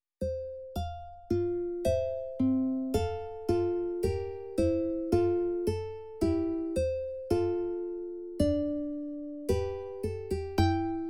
uke air bach; ukebook

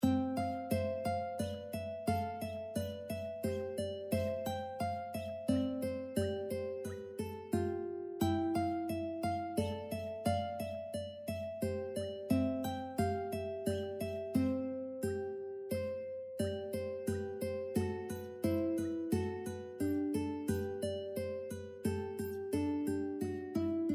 uke rondino rameau; ukebook

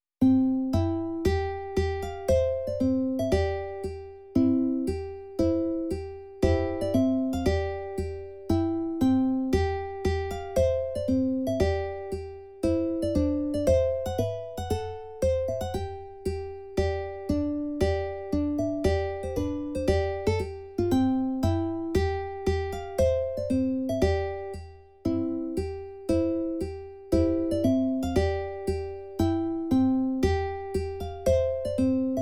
trumpet tune; clarke

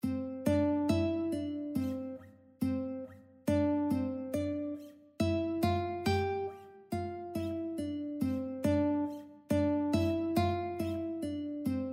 duet ukulele; Long and Short by Béla Bartók (tempo increased); classical ukulele fingerpicking duets

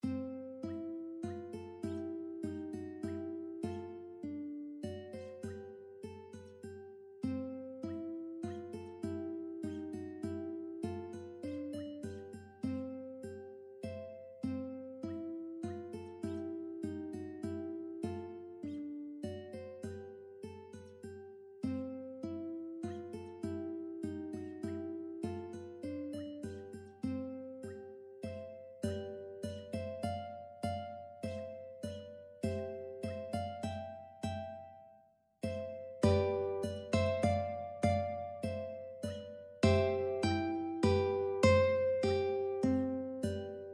duet ukulele german dance; German Dance by Joseph Haydn; classical ukulele fingerpicking duets

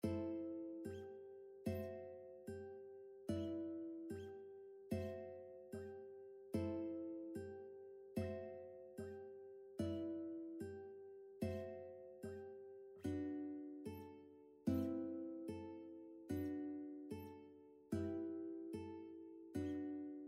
duet ukulele berceuse; Berceuse by Erik Satie; classical ukulele fingerpicking duets

